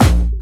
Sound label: Jump, kicks